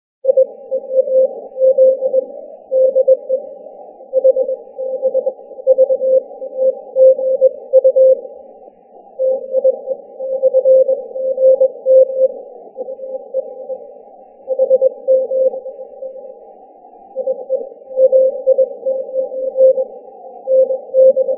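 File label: 40m long path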